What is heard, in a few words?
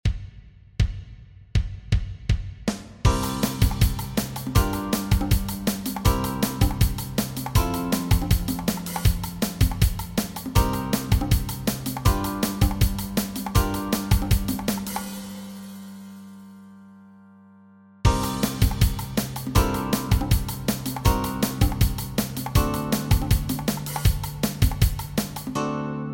Backing Track, walking bass